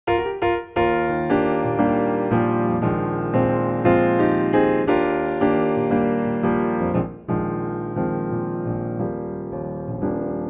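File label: Eb piano, male; 3/4 Piano; key - Eb - vocal range - Bb to C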